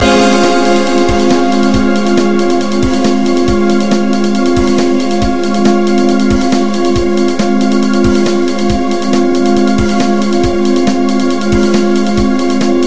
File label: Disco Remix; FL Studio